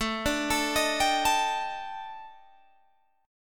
D sharp Major 7th Flat 5th A, chord

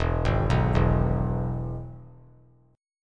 E-Major E; arpeggiated; JGuitar

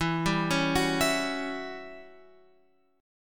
E Minor 6th Add 9th chord

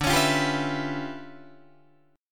D Minor Major 13th, down, Guitar, DADGAD

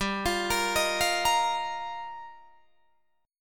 G Minor 7th Sharp 5th, Guitar, DADGAD; Gm7#5; arpeggiated

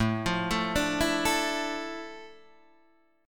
F Major 13th A, guitar chord

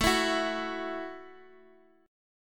A 5th 6th A, guitar chord; A56 Chord {17 19 16 X X X} strummed

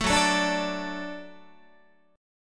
F sharp Minor Sharp 5th F sharp Guitar; strummed; JGuitar

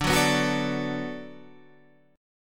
D 6th D down, chord

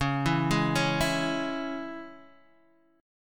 C sharp Minor down Guitar Open D

